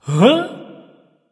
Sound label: confused; smite; ganesha